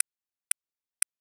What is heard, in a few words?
Burst; Sound Illustrations